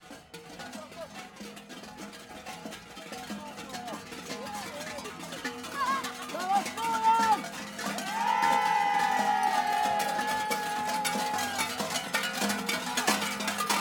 Kukuljanski Bell ringers, Bellmen, Folk Custom; Ivo Vicic

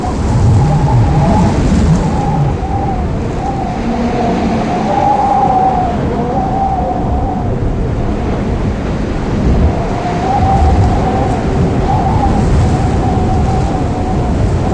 wind rooftop; hl2

